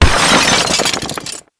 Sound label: Crash the glass